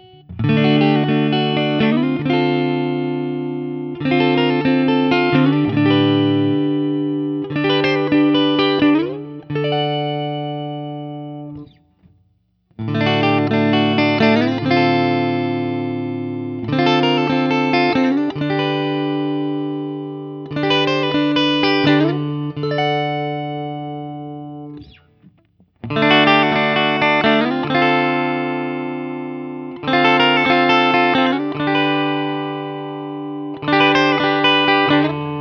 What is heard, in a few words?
Guild Starfire I SC ODS100 SC D; Guild Newark St Starfire SC